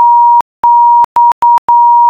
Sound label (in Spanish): tono hora